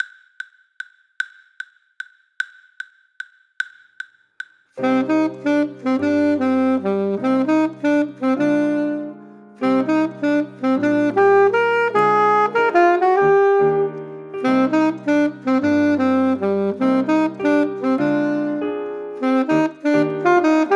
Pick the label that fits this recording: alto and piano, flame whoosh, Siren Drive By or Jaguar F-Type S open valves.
alto and piano